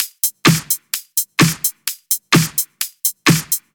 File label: Loop, 128 BPM